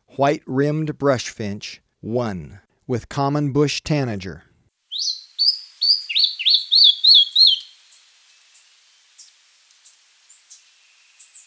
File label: White-Rimmed Brush-Finch; S. America